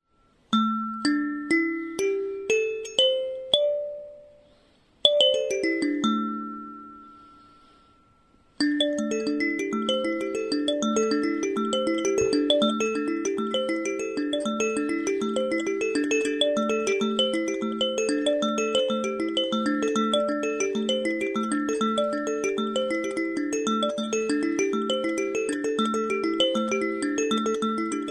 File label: tuning 7 notes